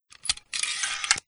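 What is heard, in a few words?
crossbow string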